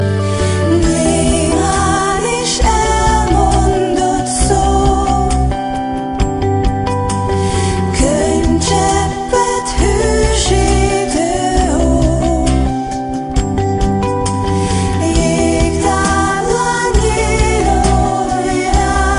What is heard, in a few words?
hungarian christmas